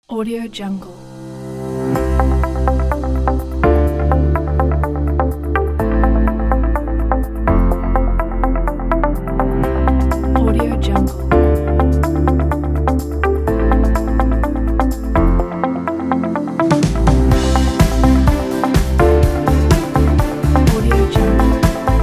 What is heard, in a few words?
uplifting inspiration background corporate